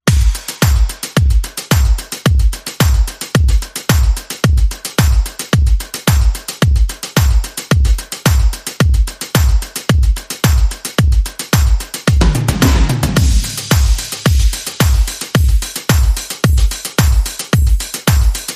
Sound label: Pumped, Perc and FX Only